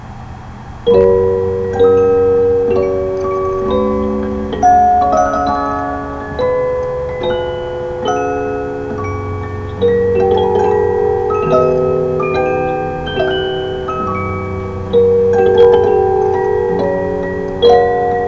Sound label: Waltz